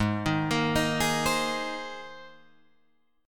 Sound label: G sharp Major Add 9th, Guitar Drop D